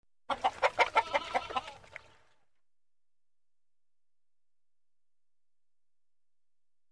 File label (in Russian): vstrevojenii petuh